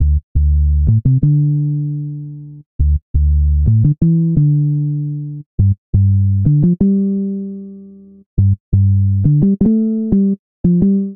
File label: Bass D Major 86bpm; Bassline; Tonal; MIDI Clips; Drive and Glow; Ableton; Factory Packs